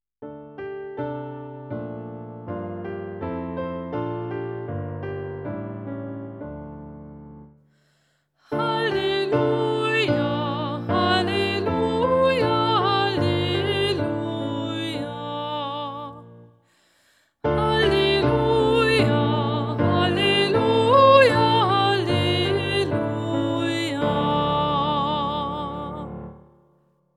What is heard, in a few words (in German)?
Halleluja; Gottesdienst zuhause; siebter Sonntag der Osterzeit